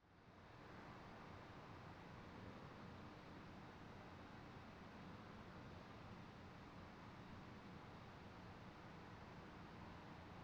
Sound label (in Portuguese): Exterior casa residencial noite; ambiencia domestica